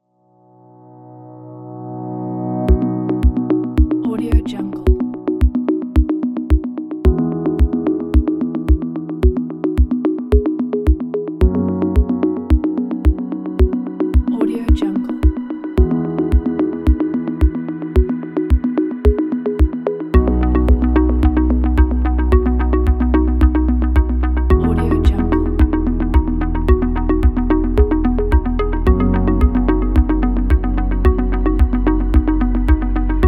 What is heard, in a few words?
dreamy